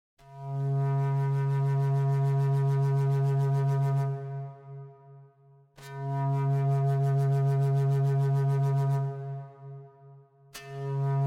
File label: native american flute